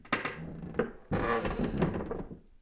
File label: house creak, houses; soundscapes